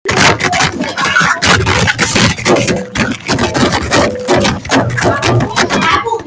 metal slide

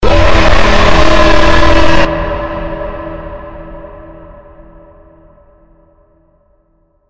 jumpscare sound; jolly robotic life 2 0

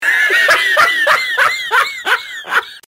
laugh; tomska

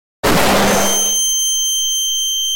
flashbang beeep sound

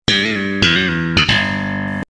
sitcom transition bass riff; seinfeld

